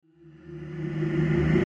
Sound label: Horror Crescendo Vox Low Reverse; Crescendos; Blastwave